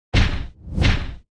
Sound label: Walk; starbites